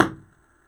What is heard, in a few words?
hall